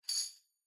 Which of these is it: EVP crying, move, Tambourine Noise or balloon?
Tambourine Noise